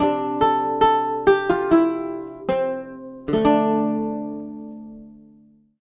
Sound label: Lute Performance; The Witcher 3 Wild Hunt; Lute Anim Performance